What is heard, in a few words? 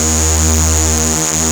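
synth Reson E3; Best Service Gigapack 1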